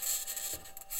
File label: mic, mars2020 edlcam